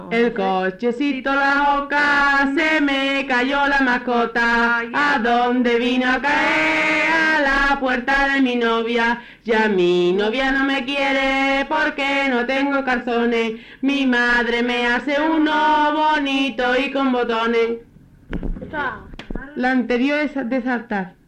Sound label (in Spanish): andalucia, eo cochecito